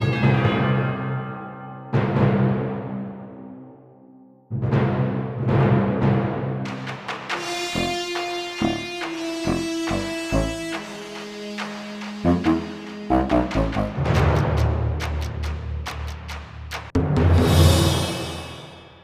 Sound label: Coliseum Stinger; epic mickey wii gamerip 2010